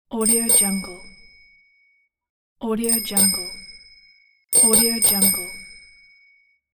bicycle bell

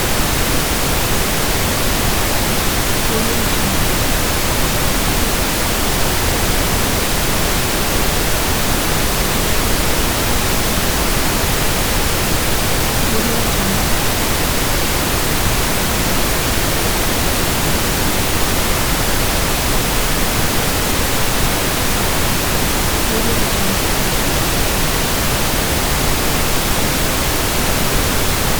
test tone pink noise; prosoundfx

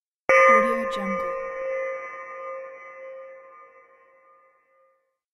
bells sound

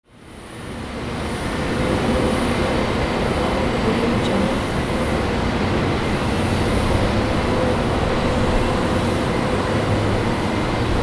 airport tarmac ambience

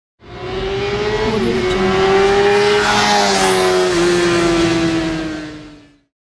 racing motorcycle passes by quickly

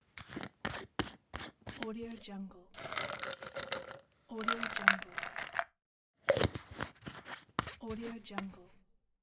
pill bottle; welltone

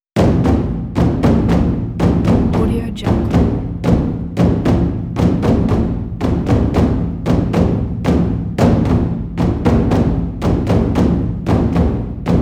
taiko groove slow tempo

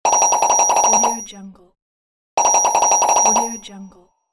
long multiple pop ding

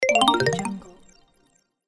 shimmering mallets success

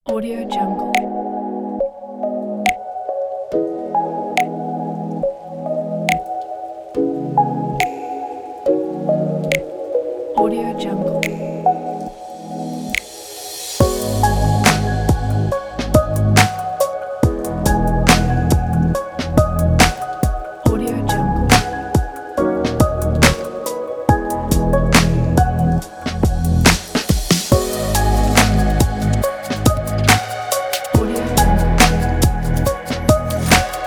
short chilled abstraction beat

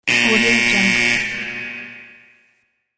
siren goal signal

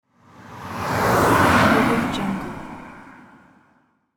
fast car pass by

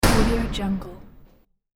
door warehouse slam; hollywoodedge

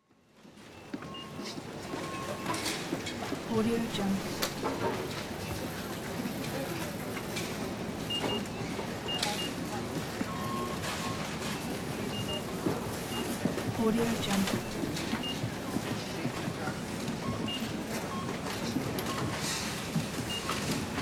grocery store ambience

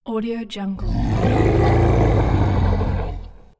monster roar

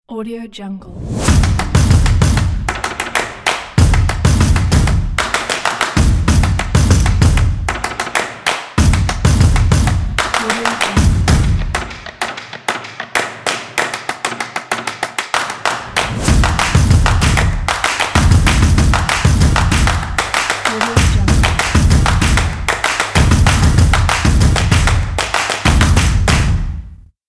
rim and clap ident

